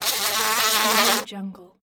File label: fly buzz